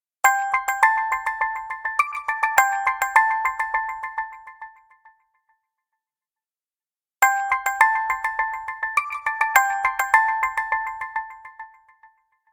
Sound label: ringer spring